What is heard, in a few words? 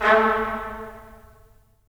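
brass trumpets staccato, left; Roland LA Composer vol. 4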